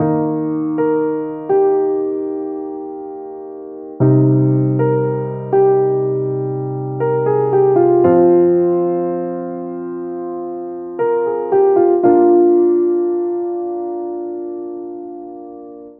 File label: EMOTION PIANO LOOPS, 120 BPM, Eb